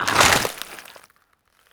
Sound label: tear out, gland; creatures; headcrab classic